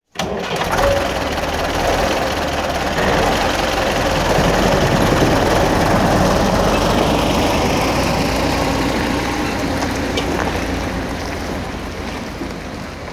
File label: Tractor Starts